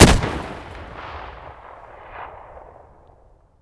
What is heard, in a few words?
rem700 outdoor close; Escape from Tarkov